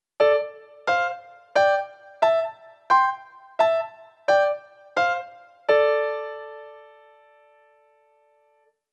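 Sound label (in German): CHINESISCHE QUARTEN a-d trumpet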